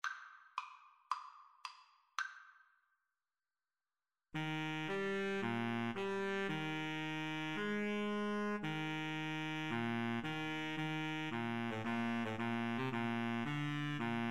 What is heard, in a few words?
Clarinet-Saxophone Duet